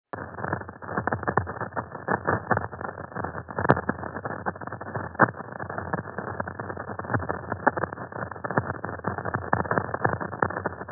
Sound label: storm